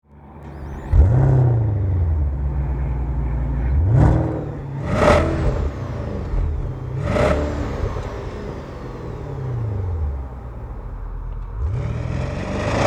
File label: Porsche Panamera Turbo